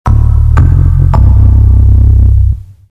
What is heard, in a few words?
Loop